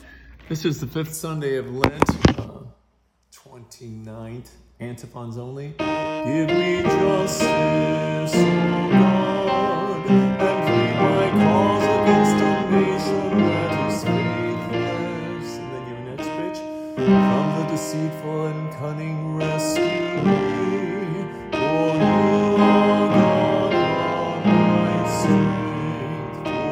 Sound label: Antiphons